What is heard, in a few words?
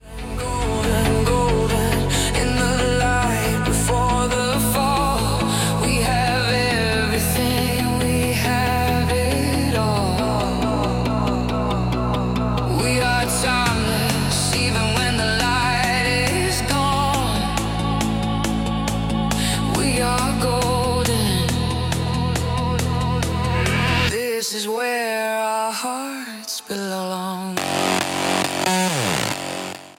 hardstyle remix